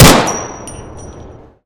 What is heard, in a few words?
shoot; Tacz1.1.5+; RSWS 1903